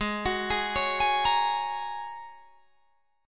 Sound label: Ab 9th Suspended 4th Ab down Guitar Drop D